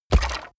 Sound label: coloss step on bones